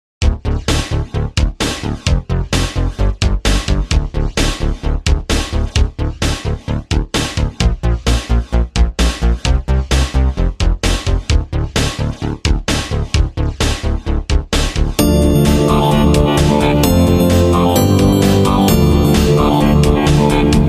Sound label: WAVESTATION ONLY; ITS LATE